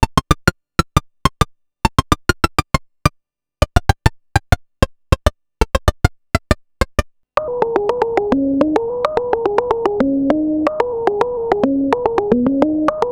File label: mini attack; Minimoog Voyager; attaques rapides; arturia origin